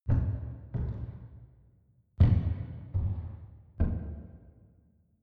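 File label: step church movement, footsteps; menschen; schritte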